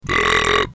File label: brap